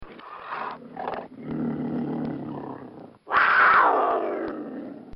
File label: Cougar Roar